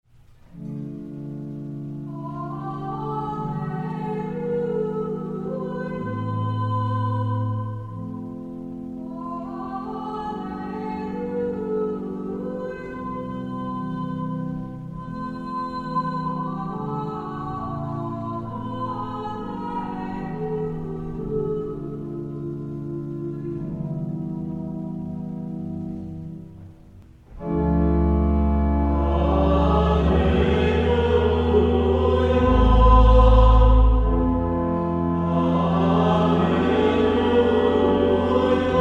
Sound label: Gospel acclamation